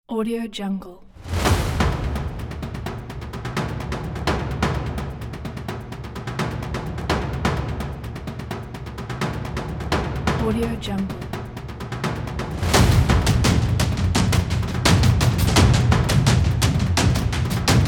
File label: the chase action drums